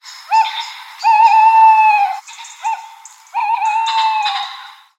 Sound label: Tawny Owl